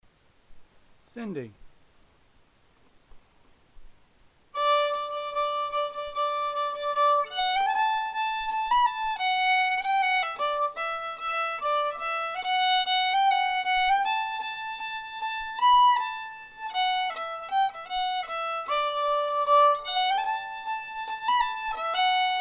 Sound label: fiddle; festival tunes